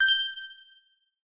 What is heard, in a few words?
click